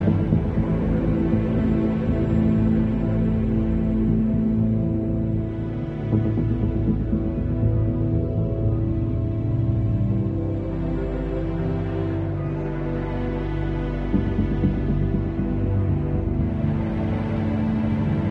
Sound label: Tense Loop